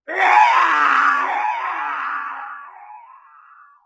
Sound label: growl female, Voice; ooh ahh